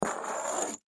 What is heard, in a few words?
Rock Scrape Small, Rock Scrape Small On Cement; Materials; Foley; Blastwave